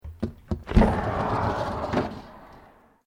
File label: Window Aluminium Sliding Small Open; Windows; Household